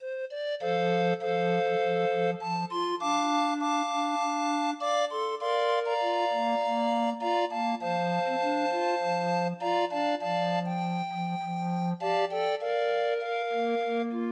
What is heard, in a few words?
Grind Organ, 20 note MIDI file; Demo of 20 note MIDI file